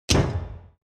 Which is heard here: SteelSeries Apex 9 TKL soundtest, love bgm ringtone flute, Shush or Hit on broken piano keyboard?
Hit on broken piano keyboard